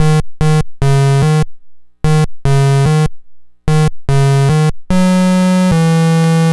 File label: Apple II Bass, Chiptune